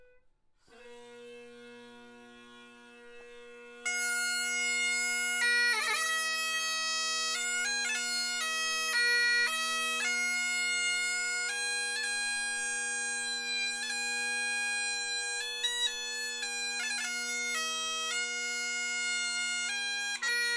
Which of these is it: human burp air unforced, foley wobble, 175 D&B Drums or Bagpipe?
Bagpipe